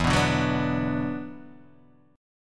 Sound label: E 7th Suspended 2nd, down, Guitar, Open D